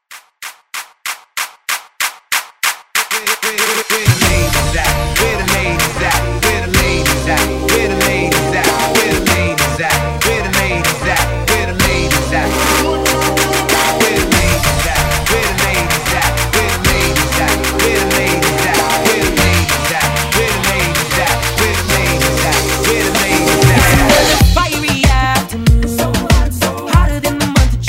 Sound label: twerk intro into moombah